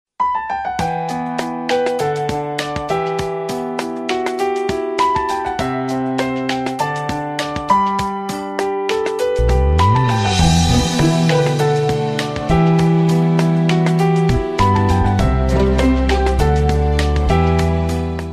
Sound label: sweet piano ringtone